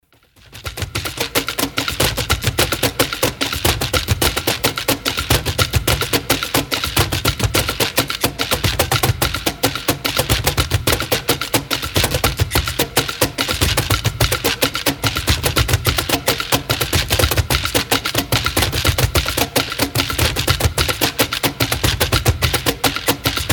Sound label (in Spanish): percusion